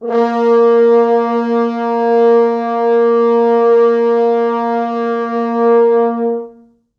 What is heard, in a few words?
4 FrHorns